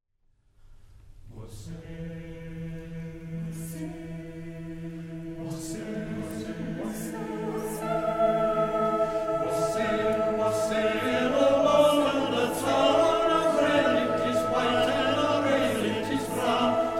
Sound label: Wassail Song Choir; Brisbane Concert Choir